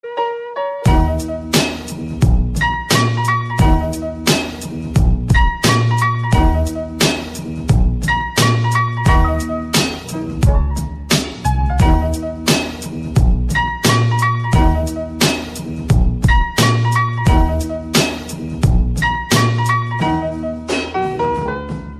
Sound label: Nokia tune jazz